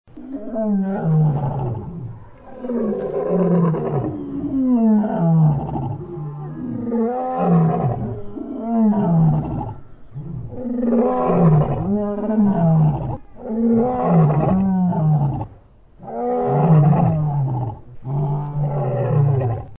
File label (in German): Zoo